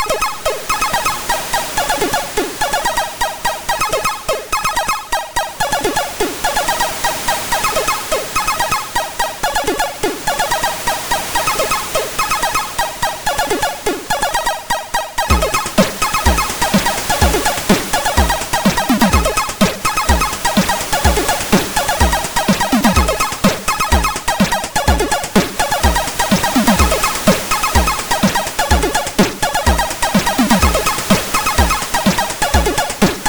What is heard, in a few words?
Beach song sid MOS6581R4